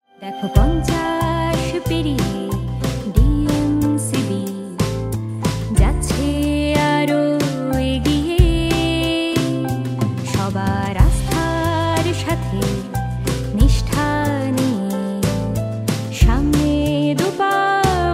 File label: Theme Song Ringtone; DMCB Bank